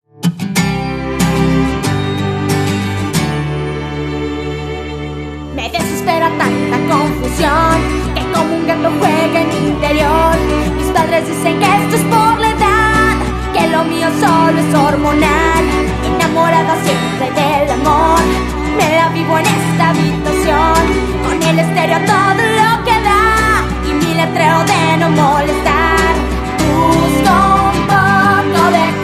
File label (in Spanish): grito